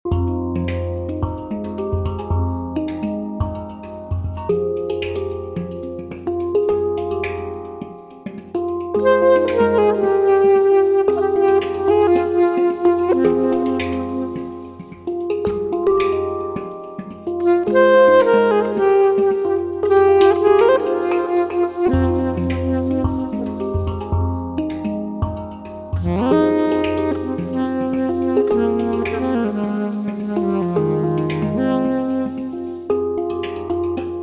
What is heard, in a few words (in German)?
Flöte